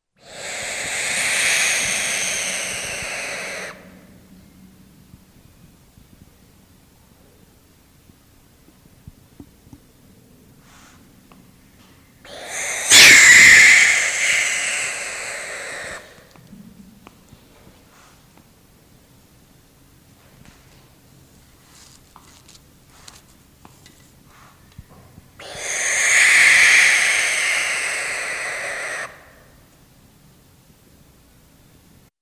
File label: Barn Owl